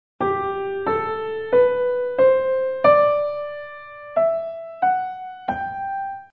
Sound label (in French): Gamme de sol majeur